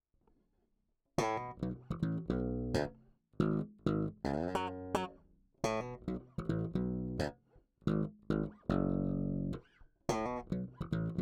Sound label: slap bridge solo